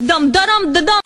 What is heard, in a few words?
dum dadum dadum SUNG